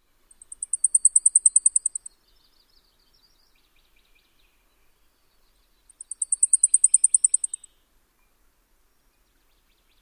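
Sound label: Blackpoll Warbler songs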